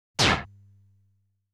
Boss Fires a Bullet; Boss 4

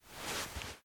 Rubbing; Still Life; windows

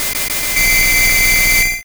Hypnosis

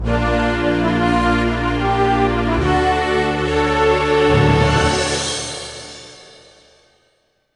Goal Message

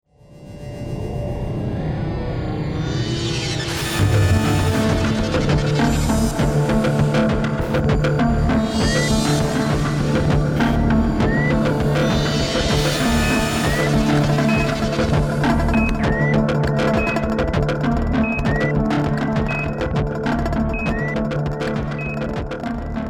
VS Vector Change

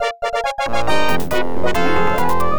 Imperial Fighter Destroyed; star wars x wing; ibm pc at

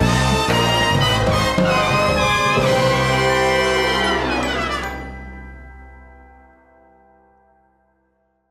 Crimewave Complete Sting; lego the incredibles 2018 pc

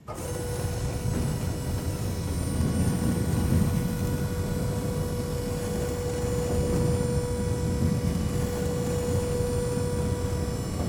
raising floor; Poppy Playtime; Environment RejectRoom

